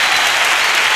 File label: Victory, SNES; Super Bomberman 2